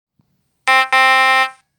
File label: TaDa